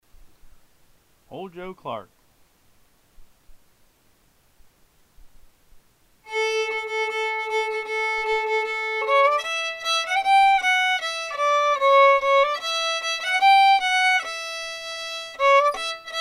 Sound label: major, fiddle; festival tunes